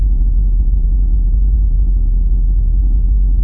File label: rumble